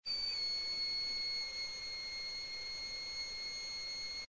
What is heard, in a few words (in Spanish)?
Chirrido; Banco de Sonidos - Facultad de Art; Lazzeri - Gallichio - Alperte - Calvo